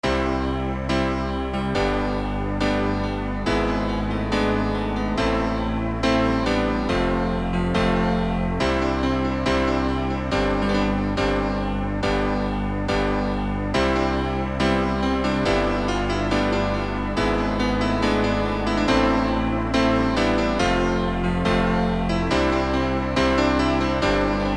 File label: piano